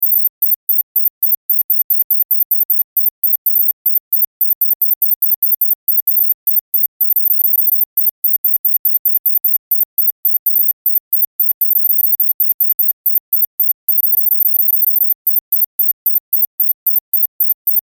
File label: Science Fiction Computer Readout High Pitch Beeps; Computers